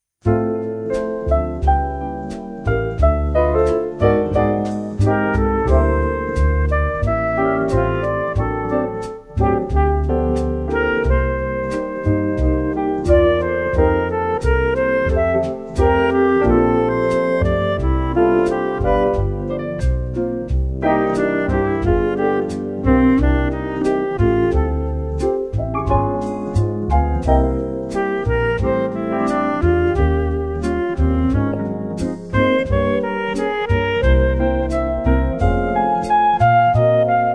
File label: christmas jazz